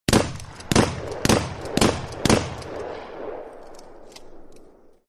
Shotguns Sound

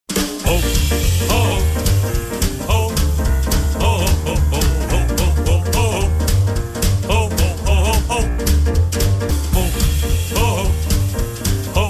Christmas Ringtone

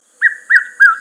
Redchested Cuckoo

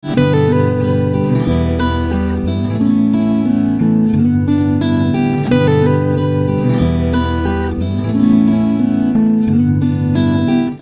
Sad Guitar Sms Tone

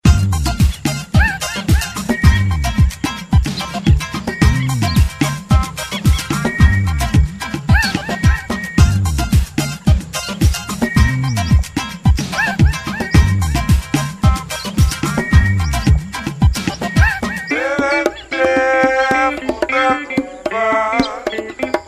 Jungle Mix

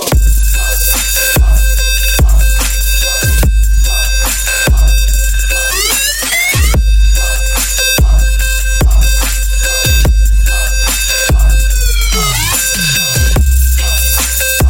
Old Trap

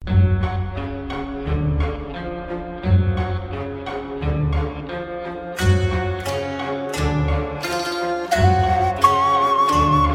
Flute Ringtone

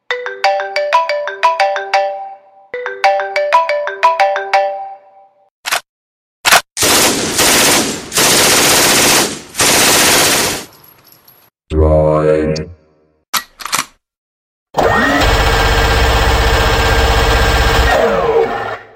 Iphone Vs Machinegun

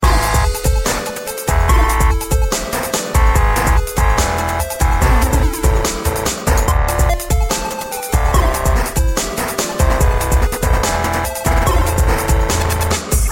Dubstep

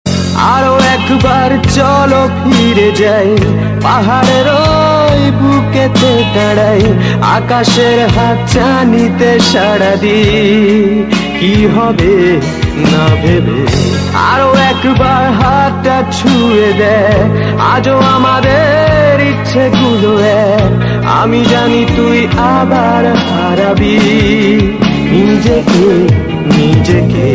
Bangla Band Song

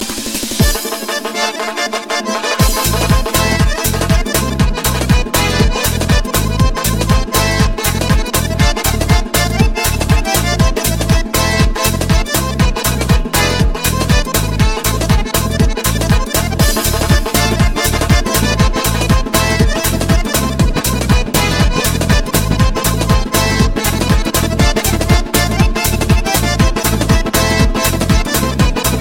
Lezginka; Kavkaz

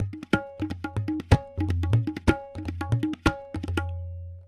Tabla

